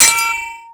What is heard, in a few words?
sword hit; Total Control - Action Cutz